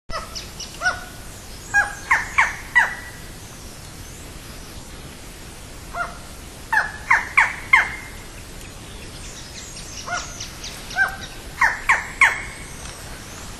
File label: Micrastur ruficollis; Portal de Piedra; Jorge La Grotteria